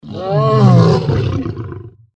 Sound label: deathclaw pain